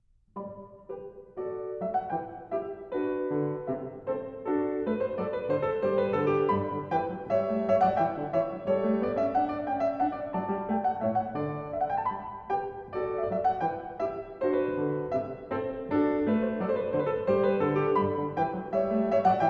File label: Gavotte, KLAVIER; French Suite No 5 In G Major; BACH